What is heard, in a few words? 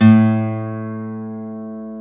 GTR, Dual Guitar; Club-50 - Foundations; Paul